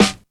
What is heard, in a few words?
studio snare g key